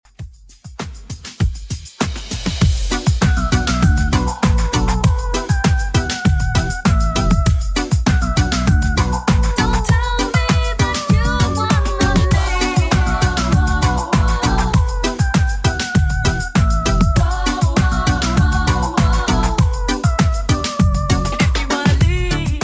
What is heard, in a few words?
90s Redrum 99 bpm